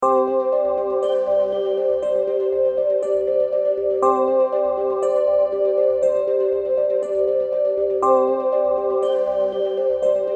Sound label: healing music; In Healing Music - 浮遊感のあるヒーリング系ミュージック